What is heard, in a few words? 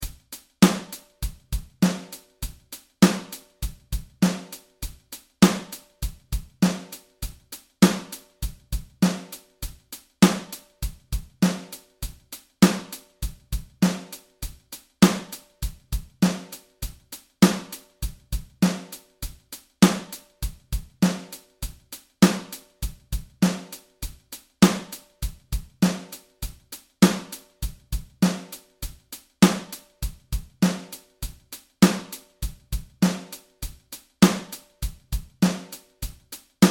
really bad 8 note drum grove